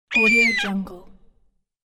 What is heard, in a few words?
screamy sea bird call; جیغ کوتاه مرغ دریایی